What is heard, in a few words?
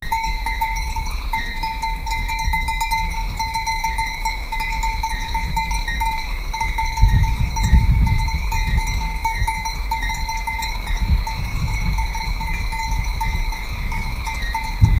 the sound of mountain goa, mountain